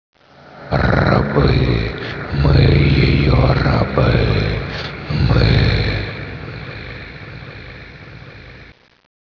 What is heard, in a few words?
zombie idle; zombie plague